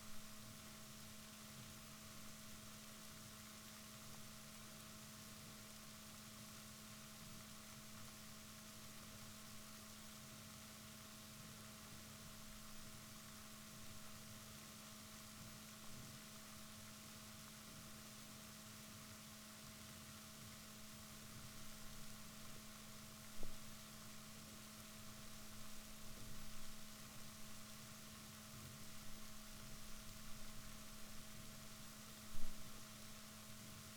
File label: rorquals negatif, Balaenoptera Physalus Chile